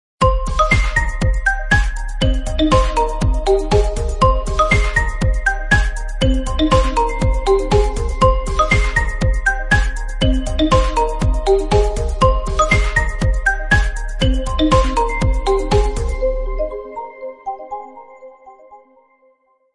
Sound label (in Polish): dzwonek